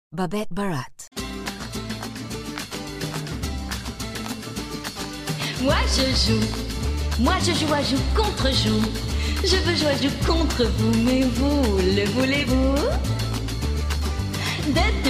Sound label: french singing